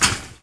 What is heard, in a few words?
net; Ssoccer